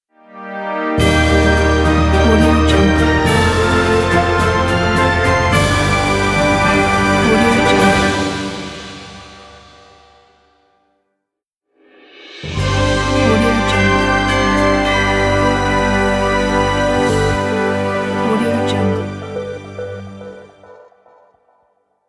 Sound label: fanfare logo